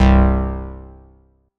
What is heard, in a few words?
synth one shot c